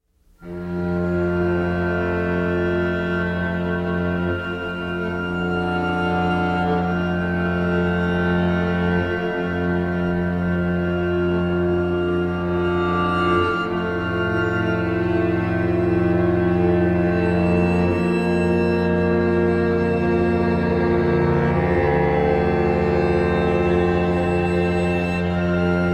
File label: Drone